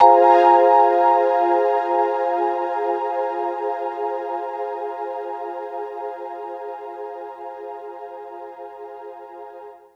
Electric Piano, Loops, Keys

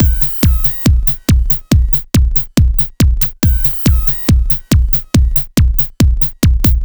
Dance Drumloop; Drums; Dance Beats

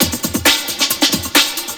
loop; SoundFactory; SMPMANIA

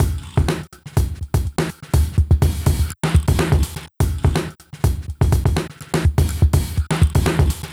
Electronica Drumloop; Drums

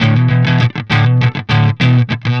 Electric Guitar, Loops; Guitars

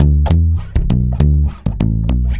Chapman Stick, Basses, Loops

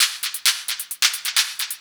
Hi-Hat Loop, Drums, Dance Beats

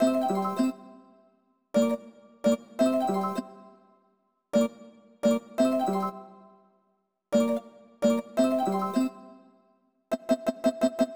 Strings

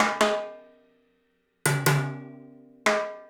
Acoustic Percussion

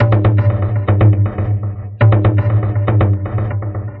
Electronic Percussion

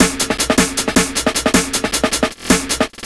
Old School Break, Drums

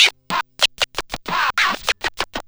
Turntables

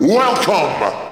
vocals; SoundFactory; SMPMANIA

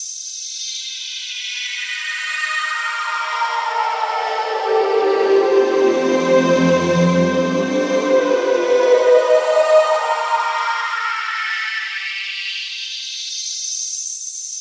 Pad, Synthesizers

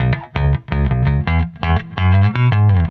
Electric Bass; Basses

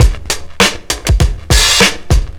LOOP; SoundFactory; DRUMLOOP

